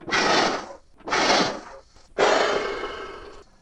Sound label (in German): Blasebalg Luftpumpe